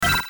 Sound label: brick